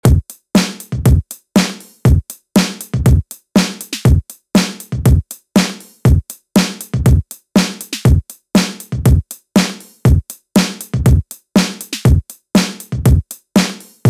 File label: drums processed